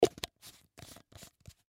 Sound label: Toy Sports Bottle, Water Bottle Twist Lid Close; Lids; Objects; Blastwave; Foley